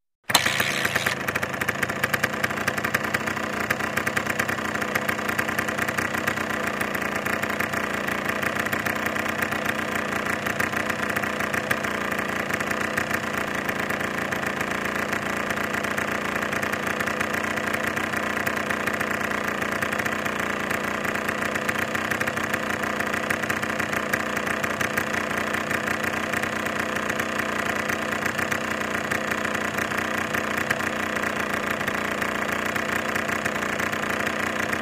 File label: Motorcycle starts, waits, turns off